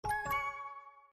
winpoints; tressettepiu

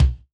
kick, jazz

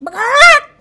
boss chicken attack; el-pollo-loco

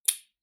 quiet click

